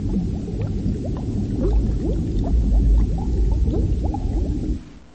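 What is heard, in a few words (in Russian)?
булькание воды на глубине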